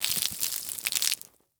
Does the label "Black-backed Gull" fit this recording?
No